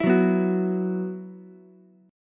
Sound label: E Suspended 2nd, Ukulele; Esus2 strummed